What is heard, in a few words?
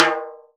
snare, timbales; Univers Sons; Basicussions